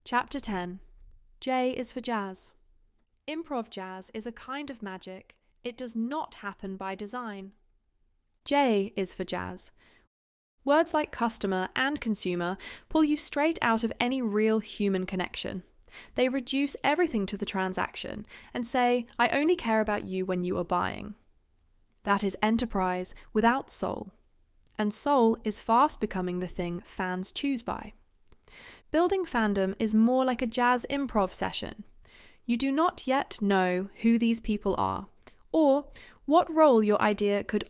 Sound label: jazz